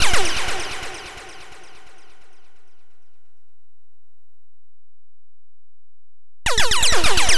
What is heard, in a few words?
NRG SFX, Trance